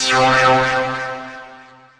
Synthesizer Middle C Notes, Lead

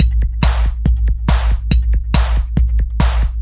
140 round rollercoaster techno, drum loops

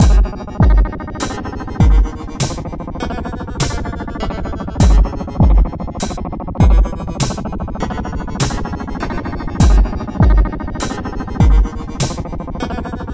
Dark

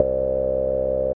filtery synth bass

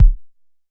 Jomox MBase, drum machine; Jomox MBase 01

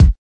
kik

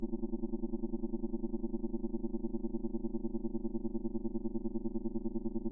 quiet machine noise; Various Strange Sounds and Effects

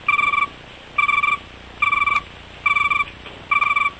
cricket

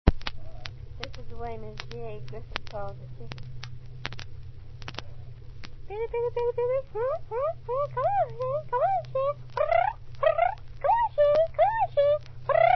calling chickens; lomax

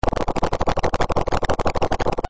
alien ping pong shooter; Weird and Interesting Hits; single hits

